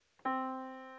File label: yamaha Disk